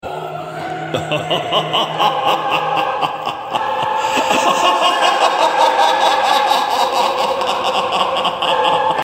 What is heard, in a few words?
nefarious laught; testicular torsion